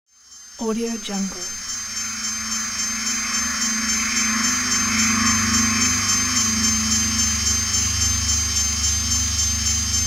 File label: electromagnetic oscillation